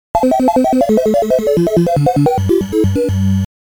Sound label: Start Music; pac pal 1983